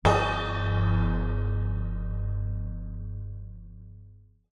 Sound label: musical cymbal crash tap med hard hand knuckle